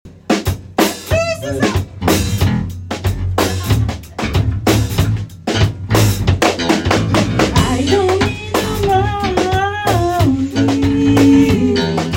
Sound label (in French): Batterie